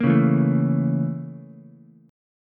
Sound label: Bb Minor Major 7th Flat 5th Bb, Ukulele; BbmM7b5 chord; strummed; 3,1,0,0; JGuitar